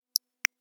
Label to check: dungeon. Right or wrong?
Wrong